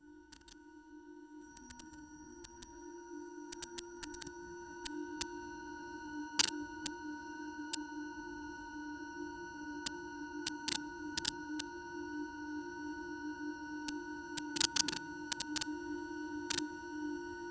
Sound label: digital sound for appeari; appearance